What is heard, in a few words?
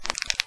Knuckles Crack